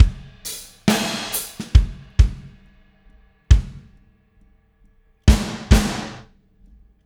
Drums Fill, Indie Rock; Sonic Foundry; Indie Rock Anthems; Dubwyzz

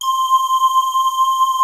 C5 L, LEAD; Music Store - Claudius Diemeter Schwerlos

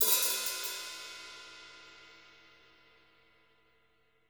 hat, drum

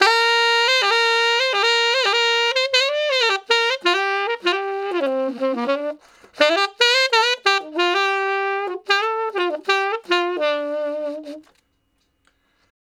Sax Slo Blus (G IV, IV, I, I), Ten Sax; Solos; Crimson Blue and Fabulous Horncraft 4 RnB; Horncraft for R&B

